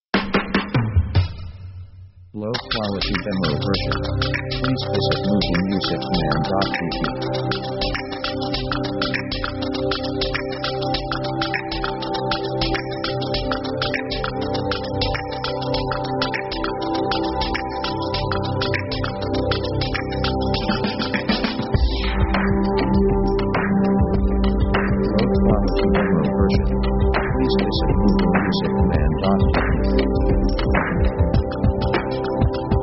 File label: light background; synth themes